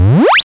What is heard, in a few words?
Power Up